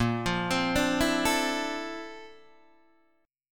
Bb Major Sharp 11th Bb down Guitar DADGAD, chord

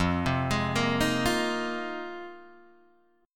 F Minor 11th, Guitar Open D

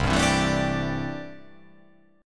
C 6th Add 9th C down

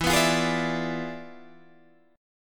E 7th Flat 9th Guitar Standard, chord; E7b9; strummed

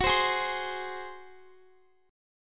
F sharp Suspended 2nd Flat 5th, Guitar; F#sus2b5 strummed; 4F,4A,5C,5G,5C,6E; chord shape

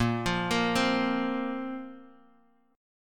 A sharp Suspended 2nd; arpeggiated; A#sus2; JGuitar

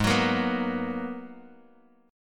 Ab Minor 9th down Bass Standard

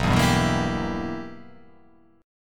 B Major 7th Suspended 4th Sharp 5th B

